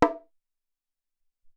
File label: Bong Lo S, Alesis SR-18